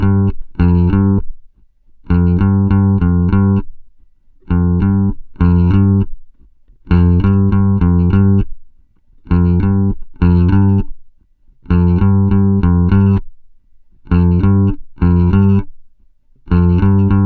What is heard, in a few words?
COOL RK 100, Bass Grooves; USB Soundscan vol.30; AKAI